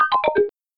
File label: kick